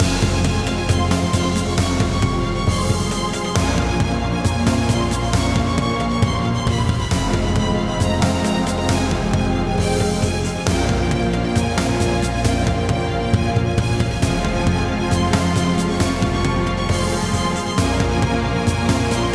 Dark Star Ambient; Aces of the Galaxy; XBLA Windows Xbox 360

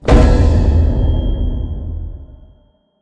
Horror Bell; Horror Bell - Main

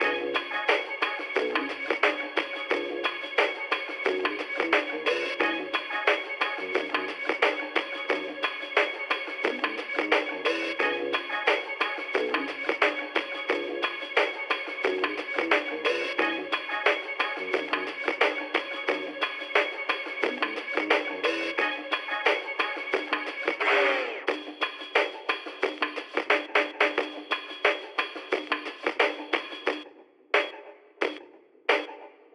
Music Mall Loop; Subway Surfers Tag

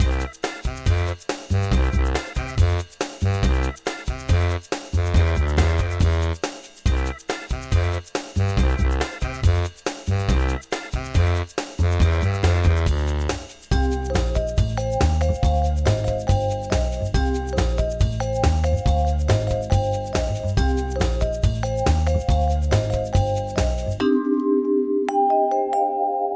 Court Music

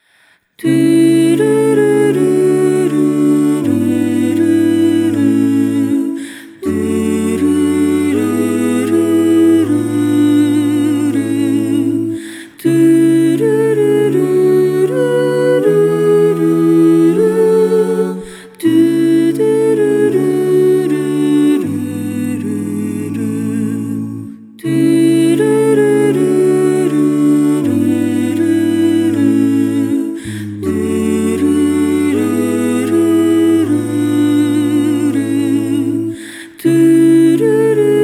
Acappella Ver.; Cookie Run OvenBreak OST Complete Collection; Tower of Frozen Waves

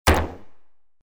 Drop; pucca n friends

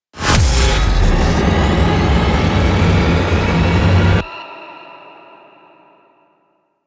combat 140bpm; Doom; GLORY

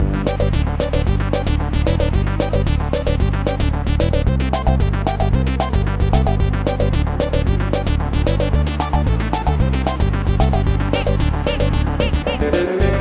Beach (Fast); Pocoyo Racing; wii

